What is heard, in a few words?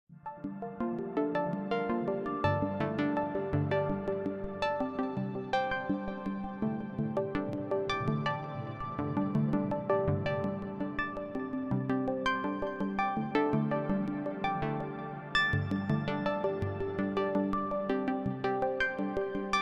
Airship, ringtones